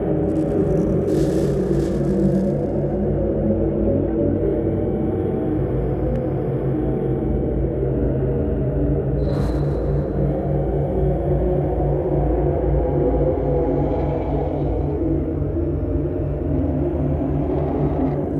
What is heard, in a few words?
Upper Level Tar Soldiers Ambience; Upper Level - Tar Soldiers Ambience 2